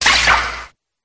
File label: cry